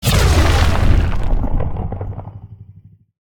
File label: Leap Attack Impact Swt FLAME; Evolve; Meteor Goliath